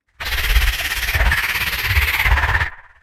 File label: Bell Eater Sing